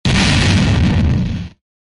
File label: fireball; medady